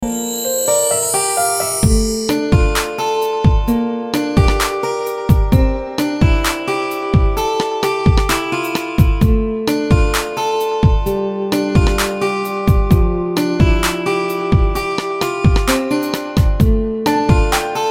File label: instrumental version